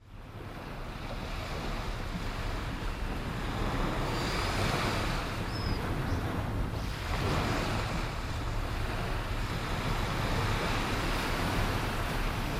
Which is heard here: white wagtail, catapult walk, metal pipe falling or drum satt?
white wagtail